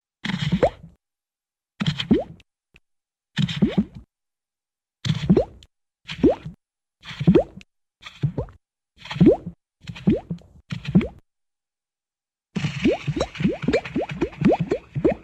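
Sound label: Blowing Single & Double Bubbles with heavy Bloop - Pour Liq; Hollywood Edge Cartoon Trax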